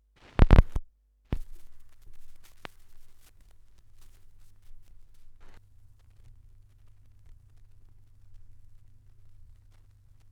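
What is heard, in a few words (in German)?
schallplattengeraeusche, record noise; wendelrekords 1.1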